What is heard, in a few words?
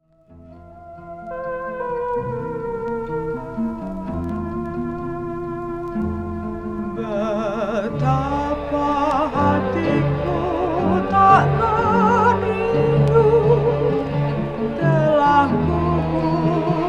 krontjong beat